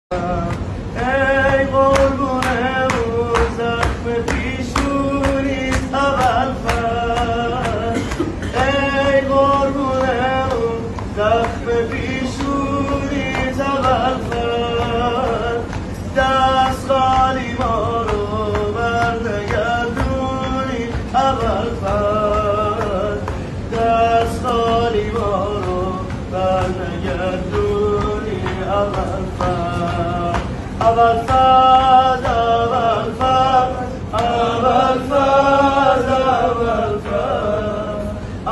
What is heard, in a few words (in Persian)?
مداحی